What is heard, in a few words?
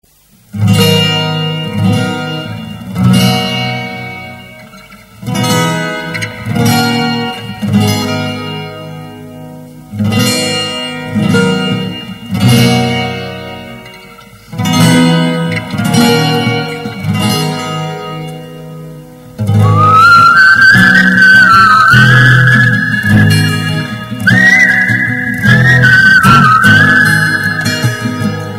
Ringtone Mobile